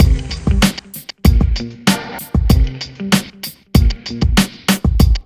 BEAT, Burning Grunge Hip Hop; USB Soundscan vol.34; AKAI

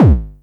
909-ish Kicks, Raw Kicks; LIMAT Div Dept; Drums

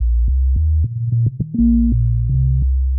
Crazysubs Bass, Drum N Bass; Sonic Foundry (Sony Creative Software); Knutrix Drum & Bass

